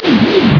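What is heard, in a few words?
teleport; Mileena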